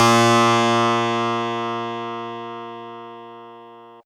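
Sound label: Fuzz Clav DX100 A#1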